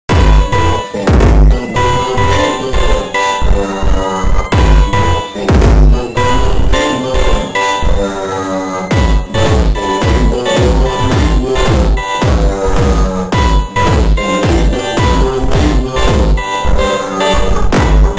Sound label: FUNK slowed reverb